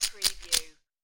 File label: Poker Chips Rattle Chips In Hand